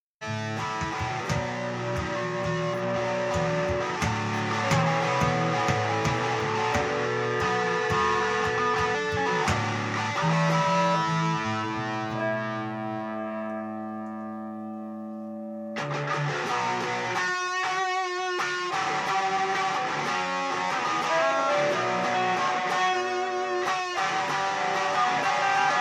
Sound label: Guitar Noise